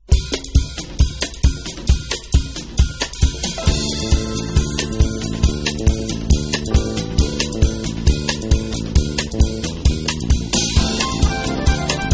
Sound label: scifi